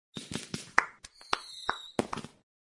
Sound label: firework Sound